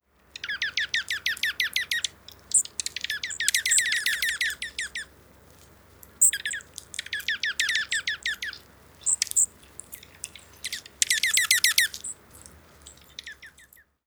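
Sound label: Dark-eyed Junco tew tew tew other calls; MB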